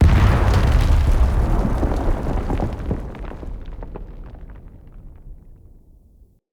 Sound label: Distant Explosion; Retrieve Bomb Distant Defacing; Encounters; Collector